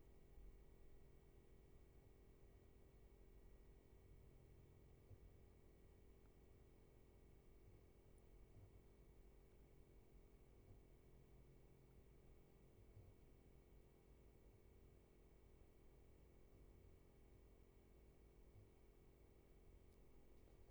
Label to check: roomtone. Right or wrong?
Right